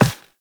walk metal, footsteps; Warframe; Characters; Operator

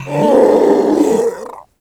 Infested Ancient Strike Scream; Warframe

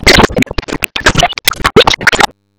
Sentient Vessel Heavy Stomp Rocks; Warframe; Tau; Abilities

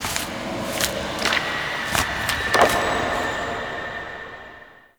Echoes Paper Scribble; Warframe; Ambience; Entrati

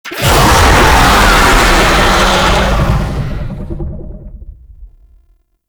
Infested Oni Vocal Spawn; Warframe

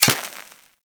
Laser Impact Metal; Warframe

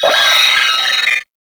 Sentient Brachiolyst Death; Warframe

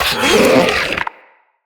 Root Spider Mother Vocal Pain, Spiders; Animals; Vocals

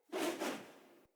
Great Sword Whoosh Accent Air; Weapons; Player; Swords; Mendicant Great Sword

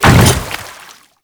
Step Water, Footsteps; Warframe; Grineer Hammer Boss

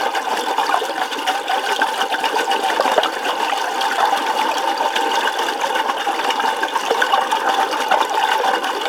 Ocean Cloning Gurgle Loop; Ambience; Props; Warframe; Grineer Ocean

